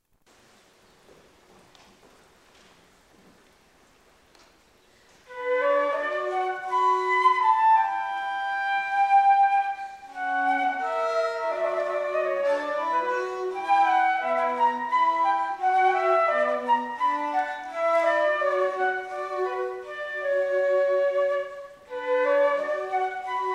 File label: Bach Menuet